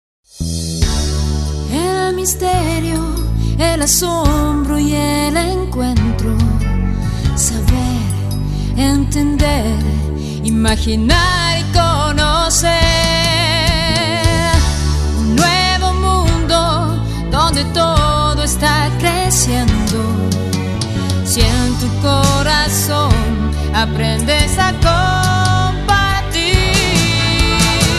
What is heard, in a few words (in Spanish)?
Himno; FM Internet